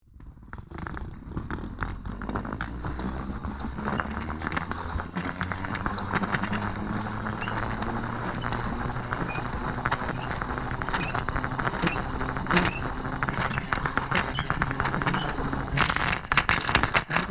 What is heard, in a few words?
Knife sharpener rotating; hand tool